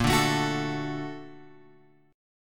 A sharp Augmented Major 7th A sharp, chord; A#+M7 Chord {6 5 7 X 7 5} strummed

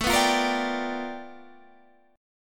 G 7th Sharp 9th A chord; G7#9

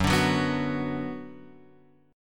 F sharp Suspended 4th Sharp 5th, Guitar, Open F; strummed; F#sus4#5

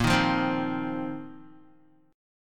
Bb Suspended 2nd Suspended 4th, Guitar; strummed

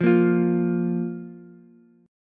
Bb 5th Bb Ukulele; Bb5 strummed

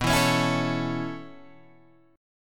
B 9th Suspended 4th B down Guitar Open G

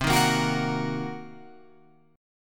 C Minor 7th Flat 5th C down Guitar Open F; Cm7b5 strummed; JGuitar

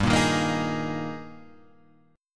G sharp Major 7th Suspended 2nd

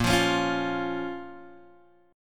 A sharp Minor A sharp down Guitar Open G

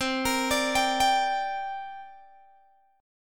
C 7th Suspended 2nd, Guitar, Open G

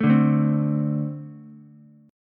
A-Minor Double Flat 5th A Ukulele; strummed; JGuitar

